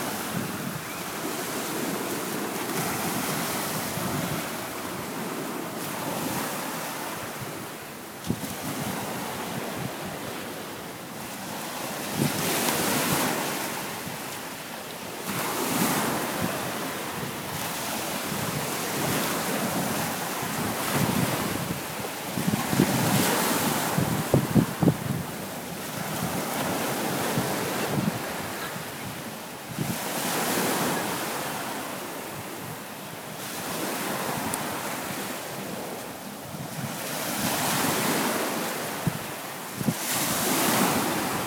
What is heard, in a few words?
Ocean Voice